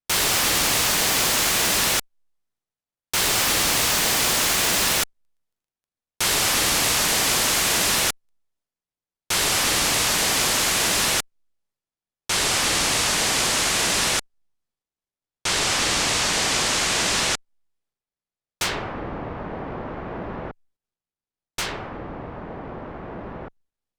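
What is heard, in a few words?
noise 20k all channels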